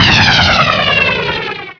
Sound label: fire fire